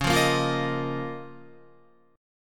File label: Db 6th Add 9th Db down Guitar; Db6add9 strummed; JGuitar